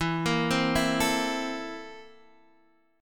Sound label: C 13th E